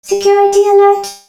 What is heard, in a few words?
hurt